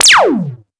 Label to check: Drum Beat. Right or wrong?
Wrong